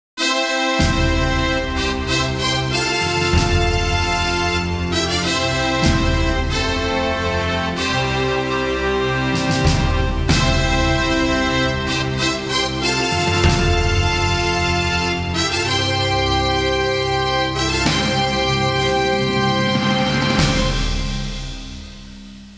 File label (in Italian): musica sinfonica; symphonic